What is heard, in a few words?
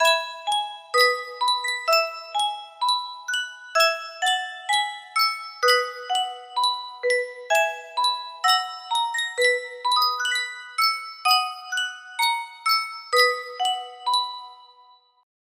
Sankyo music box; o canada